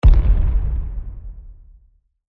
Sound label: plane explosion